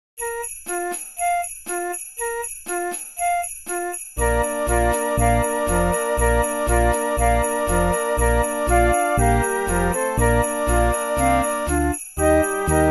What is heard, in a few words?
Jingle Bells Classic 120